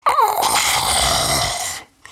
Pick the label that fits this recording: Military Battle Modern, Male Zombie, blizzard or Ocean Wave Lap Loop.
Male Zombie